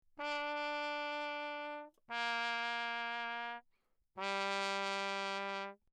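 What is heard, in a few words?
trumpet mute low; Bach; Perinétventiltrompete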